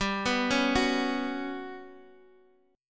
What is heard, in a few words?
G Major 7th Flat 5th, Guitar, Open G; x,x,5,4,2,4; down